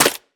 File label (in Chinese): 沾泥的红树根踩踏声